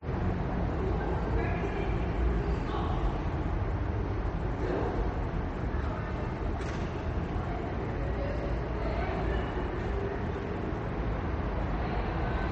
prague street late night wet echoey space passing cars rare pedestrians with ligh; light activity